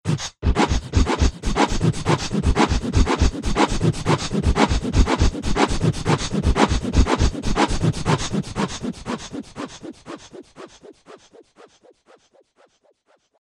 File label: scratch